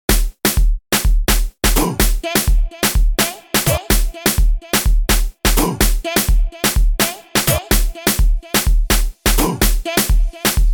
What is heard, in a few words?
Sax